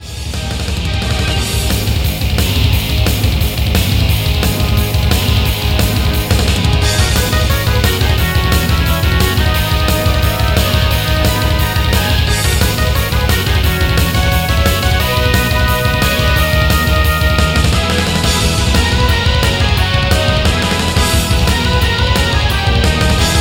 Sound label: battle